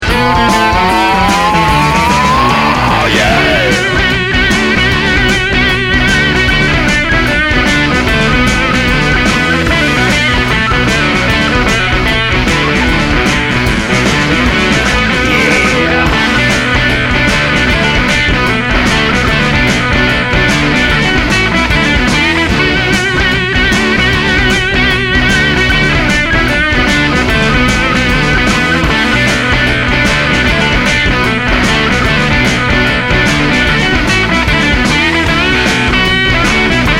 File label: bomb planted, music; Counter-Strike Global Offensive